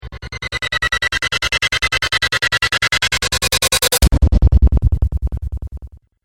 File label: Movie Impact Stutter; Miscellaneous Impact Sound Effects